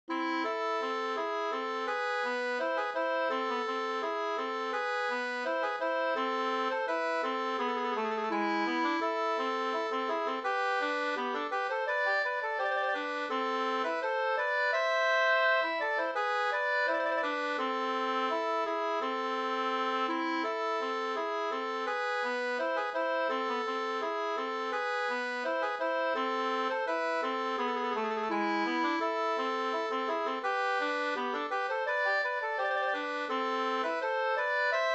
ganglat A moll; Peltoniemen Hintriikan surumarssi fi

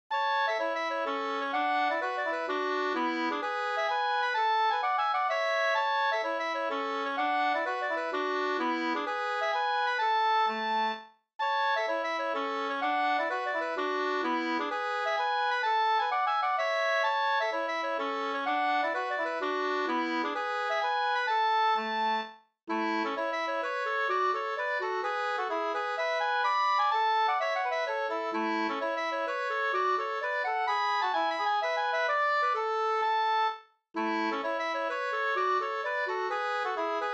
polska, 2st; Carl Pihlstrom Mellerud Vr

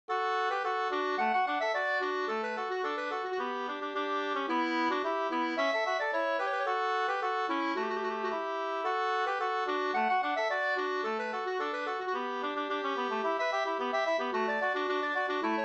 polka polkett; e durspelaren Alfred fr Mangskogen 2st

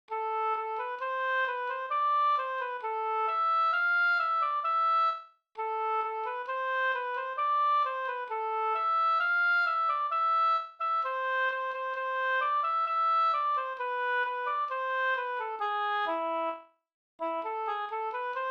vismelodi; Honsgummans visa

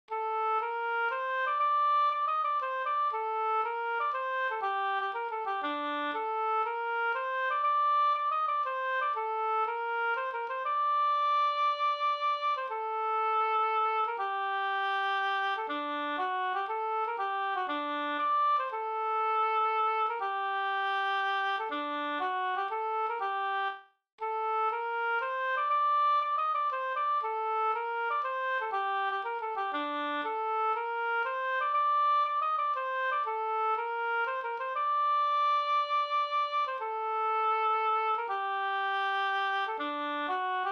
folkdans; Skralaten fr Vr Ahlstrom178

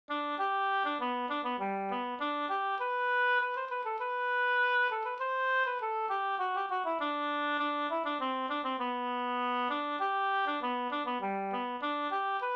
ganglat

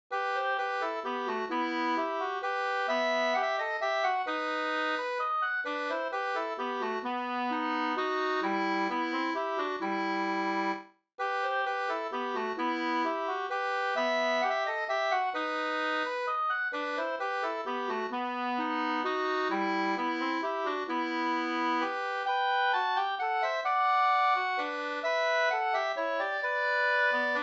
vals

